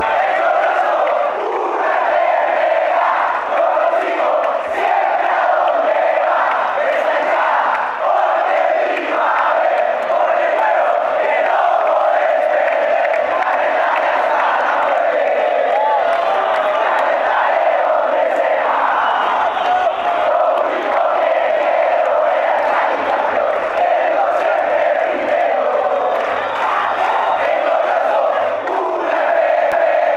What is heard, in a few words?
chant; deportivo cali